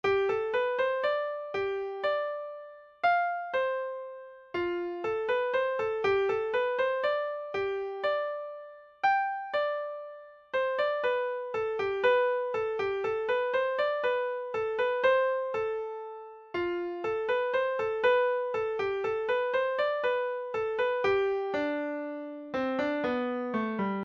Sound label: horne pipe, scottish guitar tab midis